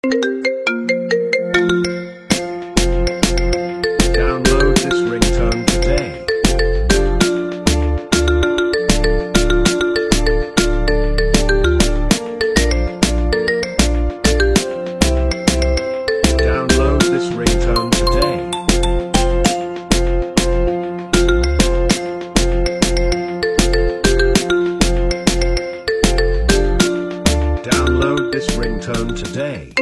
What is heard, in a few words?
Marimba Remix